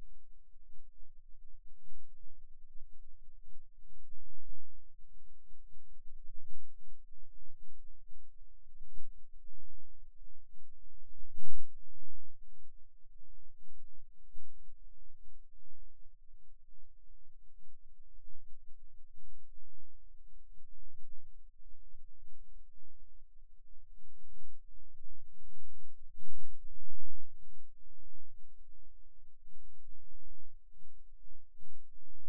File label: Rhythm Wave, eeg seizures